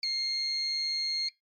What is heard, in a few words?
Hotel Room Front Door Keypad Input Code Deny Long Beep; Miscellaneous Door; Foley; Blastwave